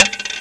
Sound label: coin land; Réflexion; Boxcars Casino 1.0b; Boxcars Casino™